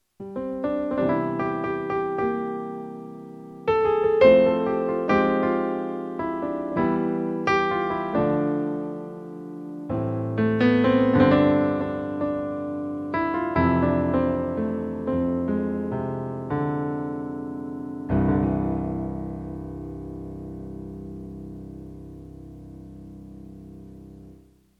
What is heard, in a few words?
Short Jazz Theme